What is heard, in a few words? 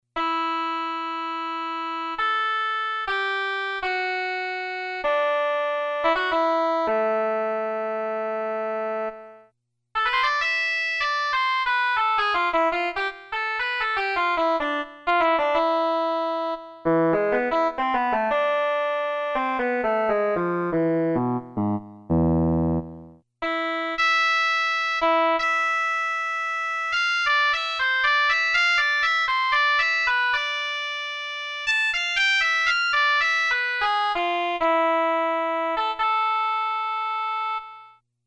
Oboe, Casio CSM-1; Tones